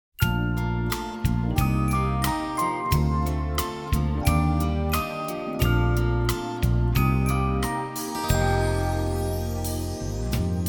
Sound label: karaoke